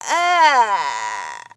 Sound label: disgust